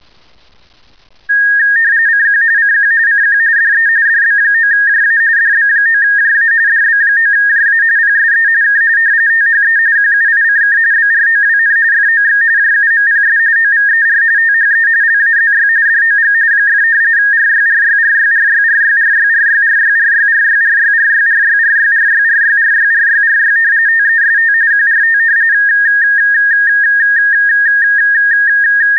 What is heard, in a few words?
rtty; Fernschreiben, Text, Telegrafie; 45.45 Baud